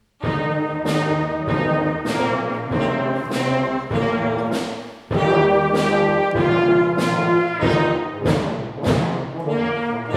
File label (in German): Schulorchester Old Mac Donald; Klasse 7